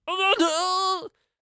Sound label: Death Vocals; Raptor Rider